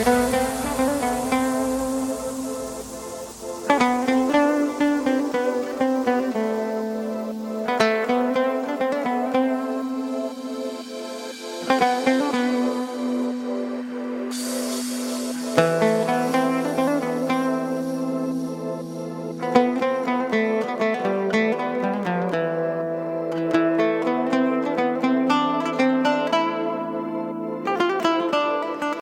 instrumental

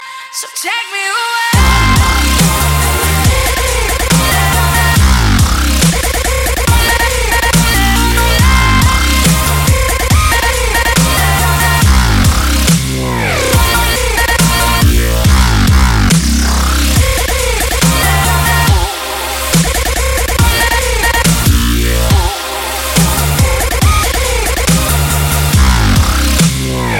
dubstep; iphone 7

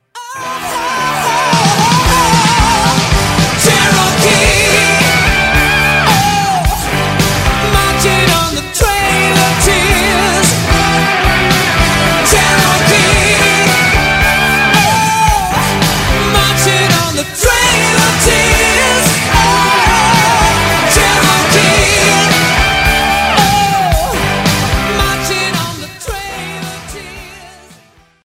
80s hit

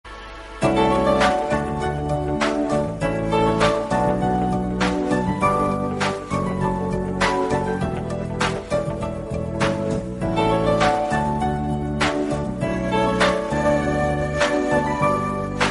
cool mobile, ringtone

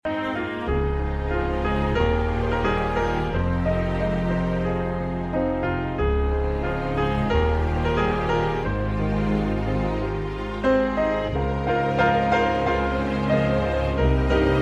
asian dream song

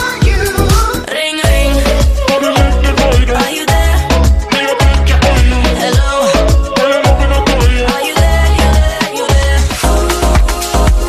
ring ring hot electro

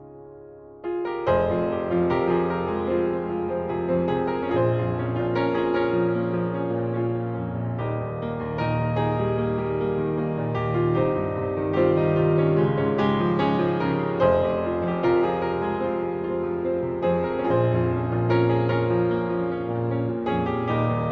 piano; aashiqui 2

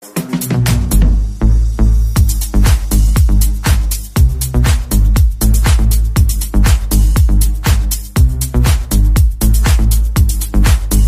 boost; iphone8

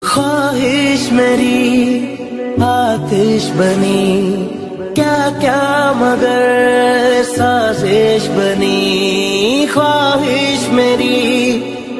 pakistani sad

